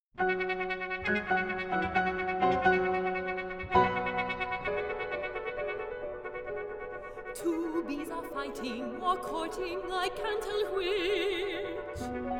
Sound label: Two Bees Fighting, Solo Trumpet; George Vosburgh; Ewazen; Four Songs from 'To Cast a Shadow Again' - III; Trumpet Masterworks